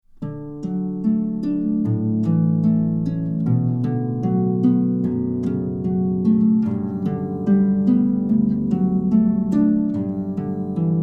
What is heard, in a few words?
Harp; Pachelbel